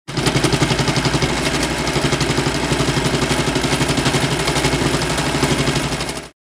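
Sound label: industrial sewing machine sound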